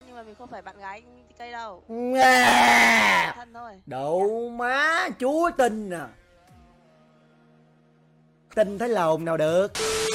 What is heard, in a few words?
hhihi